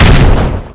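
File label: explosion metal armor vehicle close; Explosions; Call of Duty Modern Warfare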